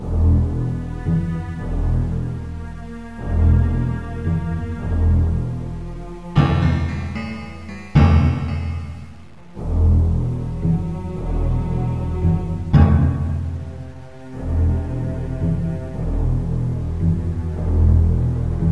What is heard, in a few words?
Ambient Music